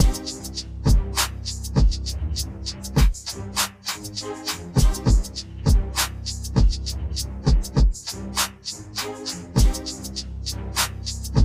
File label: beat